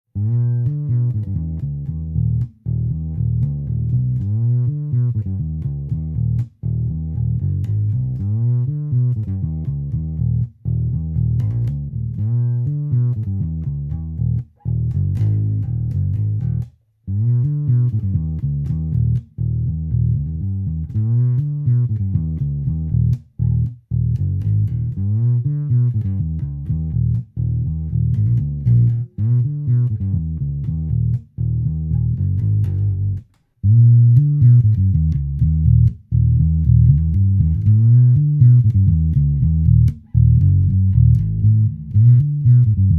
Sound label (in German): Mikro Godin EQ Flat Phat null mid voll